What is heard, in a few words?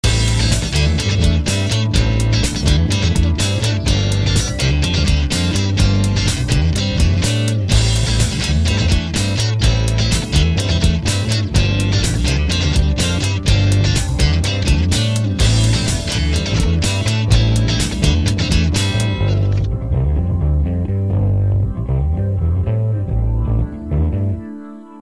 funk